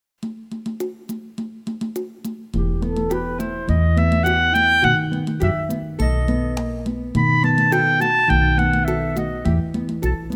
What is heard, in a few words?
E Minor Scale Study